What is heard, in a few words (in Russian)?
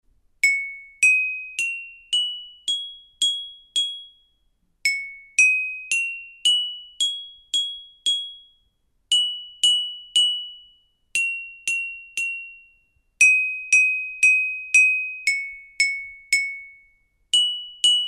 parovoz, ksilofon